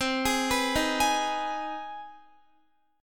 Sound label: C Minor Major 7th Sharp 5th, Guitar; arpeggiated; CmM7#5; x,15,18,16,15,15; 4E,4A,5D,5G,5C,6F